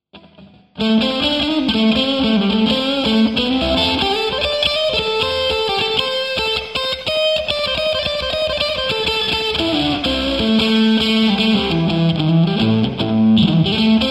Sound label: JCM800 OD; P90